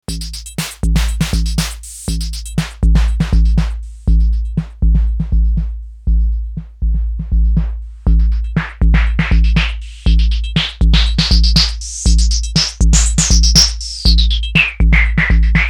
Filter TP; Blck Noir

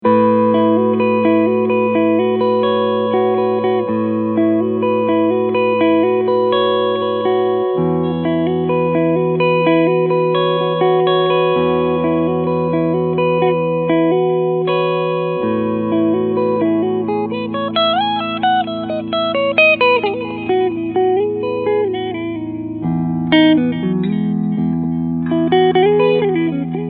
Hagstrom Super Swede Limited Cleansound beide Humbucker

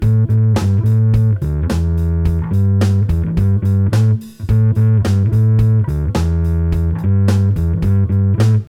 Earthquaker Devices Blumes Mode 3 Gain 11 Uhr Tone 12 Uhr

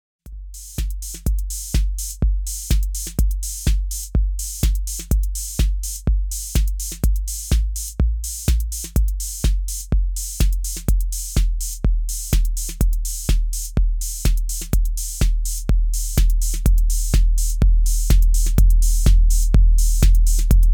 Roland DJ 808 TR S Instrumenten Parameter

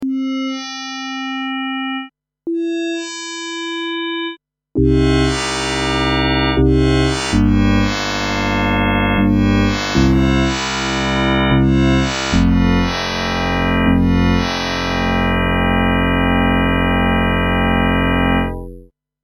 Timbre Frame Spektrum, FM Synth

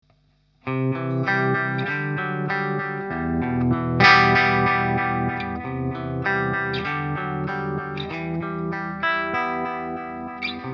sägezahn; Walrus Audio Monument

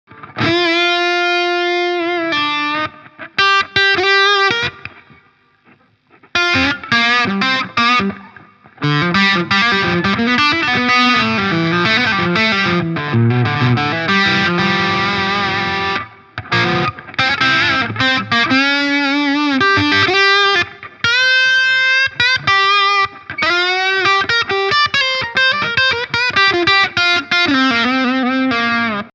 PRS DGT Steg verzerrt; PRS David Grissom DGT

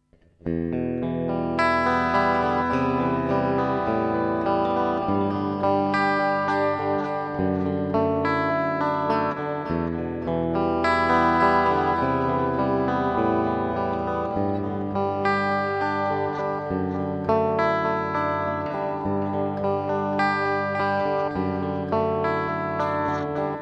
Ballade

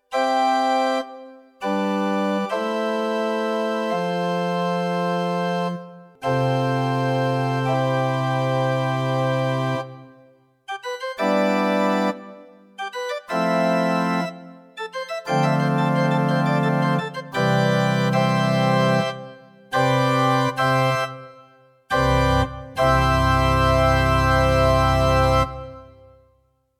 Orgel

